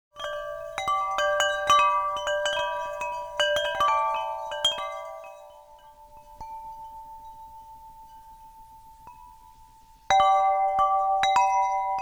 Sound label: SatelliteBox Windchimes; speldosa vindspel